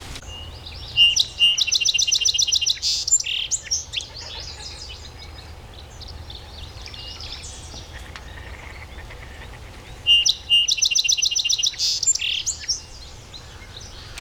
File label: song sparrow